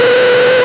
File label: Synth Shots; Vengeance Samples